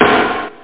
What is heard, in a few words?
disco snare